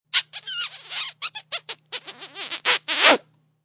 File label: Long Squeal Kiss; Kiss sound; Kissing; Sound Effects:Human